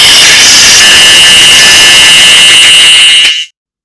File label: Nokia 2.4 Sms Ringtone